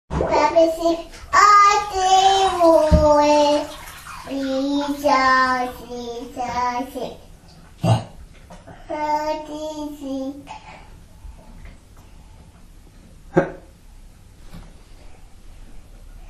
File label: singing in the bath